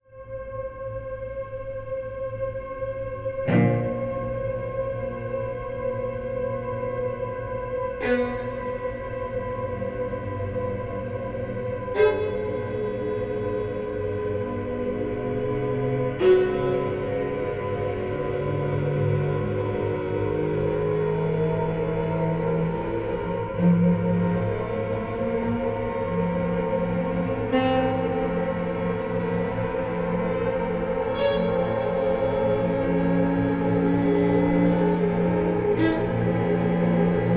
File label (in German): trailer musik